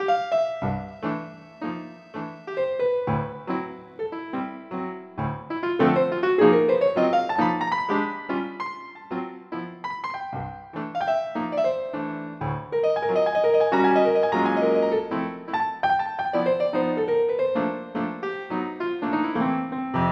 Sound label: JAZZ